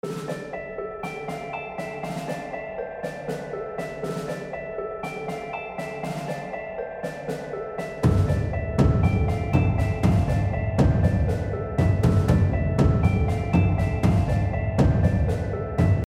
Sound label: fail; Black Baron